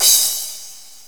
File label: Crash Cymbal 2; GMPlay; Drums; XG